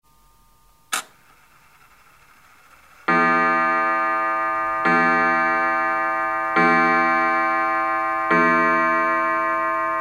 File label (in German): Junghans Rundgong